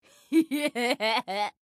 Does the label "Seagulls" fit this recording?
No